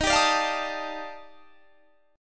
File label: D sharp Major Sharp 11th; D#M#11; strummed